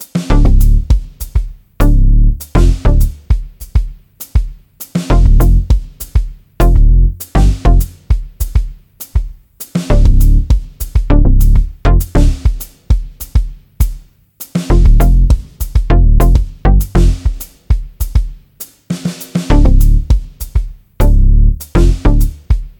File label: Tense; Everspace 2